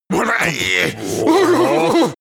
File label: excited trolls